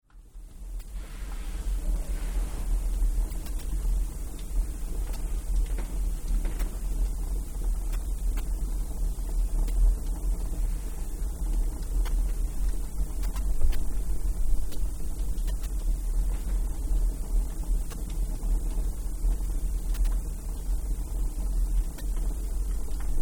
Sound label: Furnace roar cremation fire box